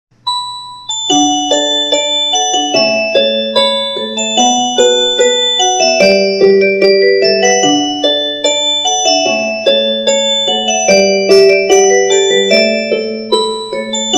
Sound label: Ring; Rage 35GN